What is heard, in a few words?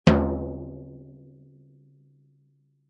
Cartoon Drum Tympani, Tympani Pound: Big drum roll, anticipation.; Comedy/Cartoon - Drum, Tympani Pound: Big drum roll, anticipation; Funny Sounds; Lynne Music